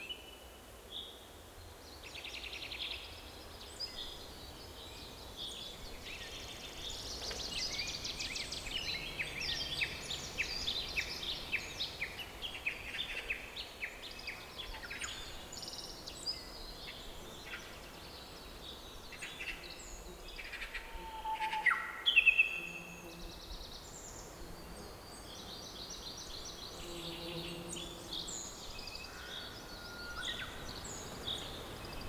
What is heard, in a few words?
Forest Loop